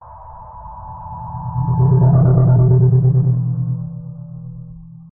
Whoosh Fly By Pass By Sci Fi Abstract Movement Flutter Chaotic; Skyes Audio